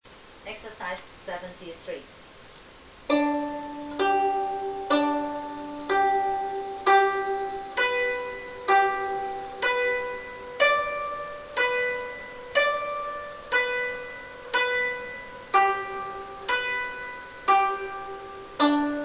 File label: zheng